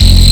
Railgun Launcher Rail

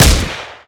Chaingun Shoot

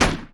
Point Defense Shoot

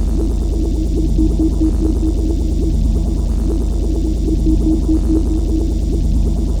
Hyperdrive Beacon Operating

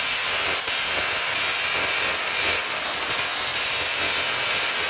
Mining Laser Beam

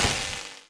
Disruptor Hit

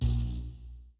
Disruptor Discharge

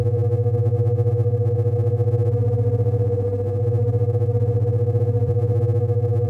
Tractor Beam Emit

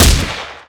Chaingun Shoot

